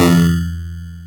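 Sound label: Bitcrushed guitar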